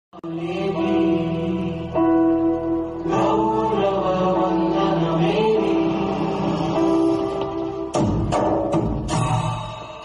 sad bgm ringtone

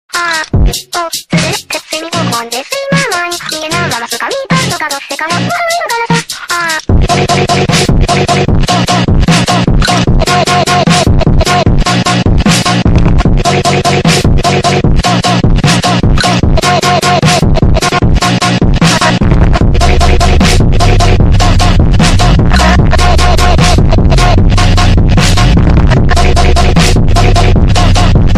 ringtone

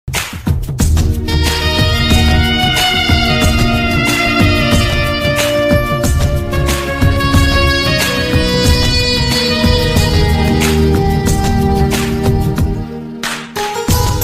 kannada love song kannada ringtone song

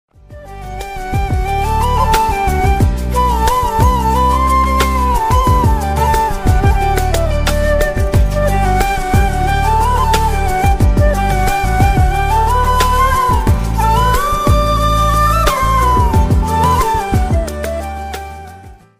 bgm song ringtone; Oh My Kadavule

